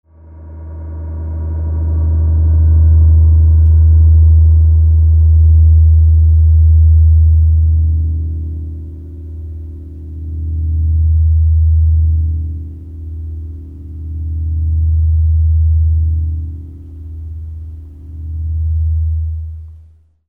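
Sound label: percussion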